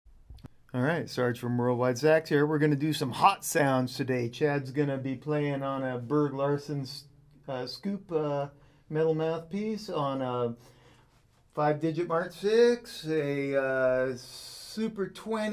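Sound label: mk vi vs super 20 vs 6M, saxophone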